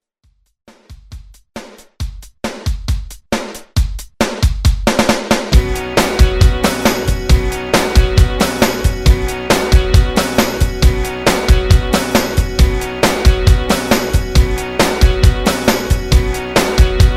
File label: 90s Rock Redrum